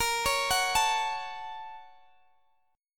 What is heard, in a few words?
Bb Minor Major 7th Sharp 5th, Guitar, Open D; x,x,20,19,21,19